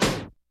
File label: KICK SNARE; Kid Nepro; Elite; KICKS SNARES